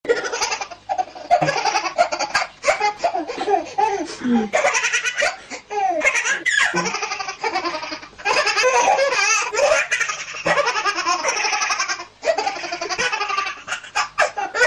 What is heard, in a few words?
baby laughing fun; children